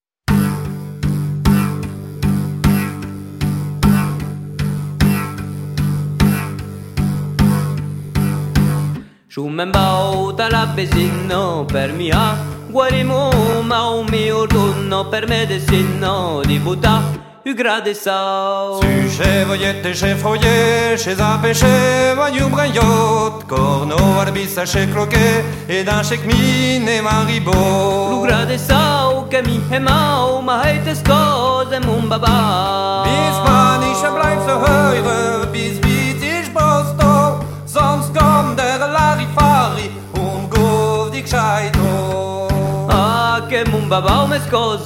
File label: mazurka